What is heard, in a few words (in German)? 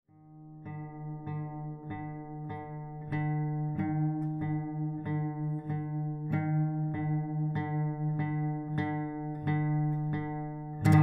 e-musik